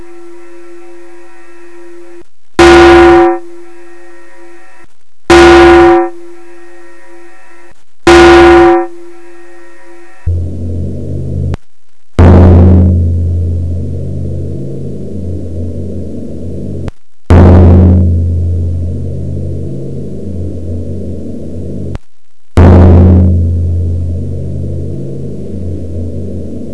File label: Drum